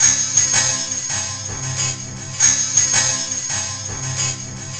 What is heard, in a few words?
Shaved Guitar, Strings; Sonic Foundry (Sony Creative Software); Myths of Technology